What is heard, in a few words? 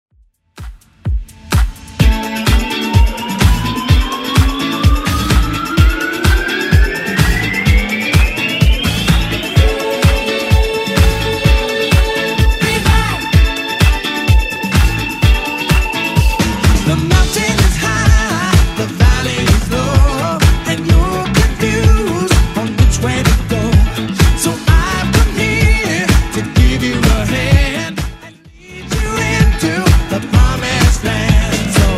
80s Redrum 127bpm